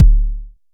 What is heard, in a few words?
analog tonal, Kickbank